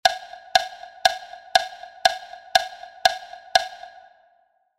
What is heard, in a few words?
wood block audio